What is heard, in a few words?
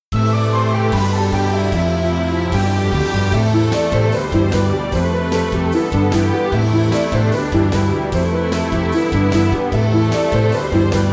Battle Jingle